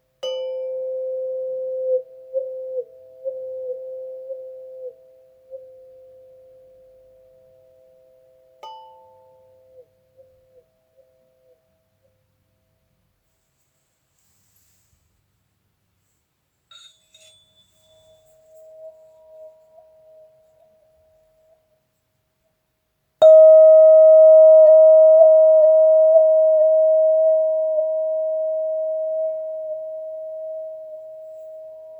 WähWäh Schlaginstrument

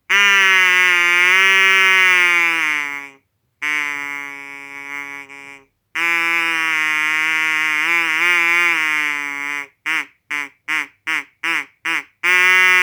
Dodo Blasinstrument

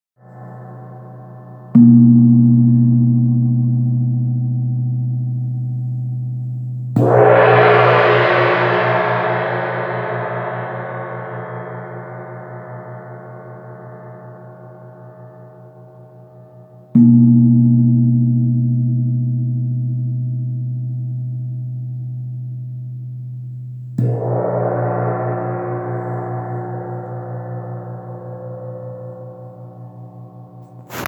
Thai und Windgong Perkussion

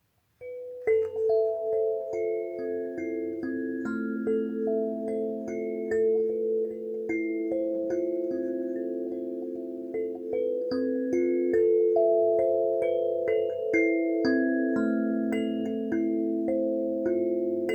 Sansula = Kalimba Zupfinstrument

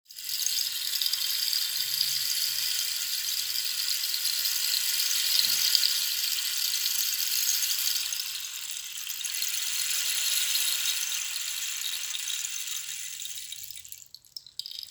Regenrohr sanftes Kippen

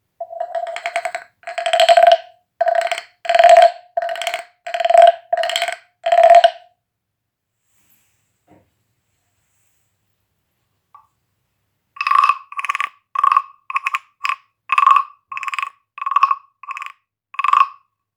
Frösche Streichinstrument

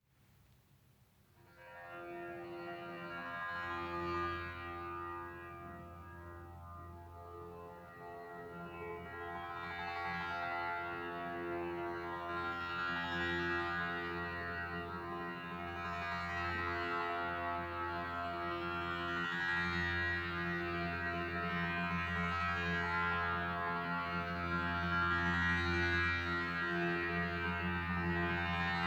Klangliege F

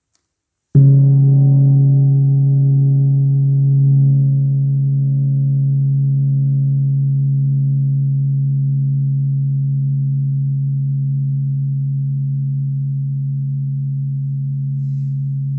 Gong Grotta Sonora Cosmic Echo; App Recorder Plus

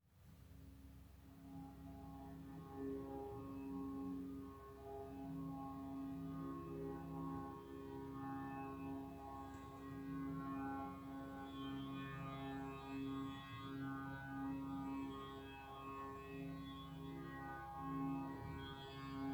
Monochord Cis

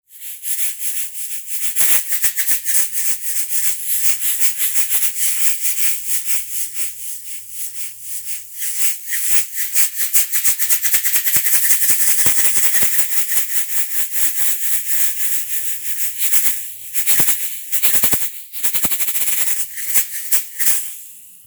Shaker Schüttelinstrument

